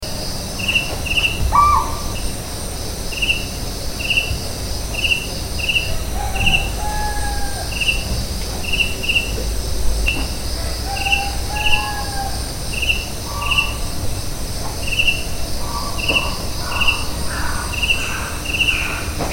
Asian barred owlet Glaucidium cuculoides, Kaziranga